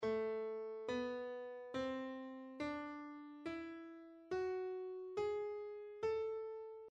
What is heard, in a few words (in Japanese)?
Am 旋律短音階 上行形